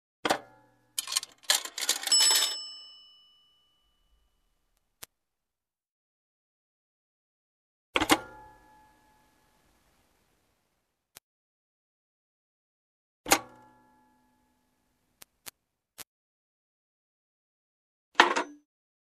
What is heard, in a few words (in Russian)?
telefon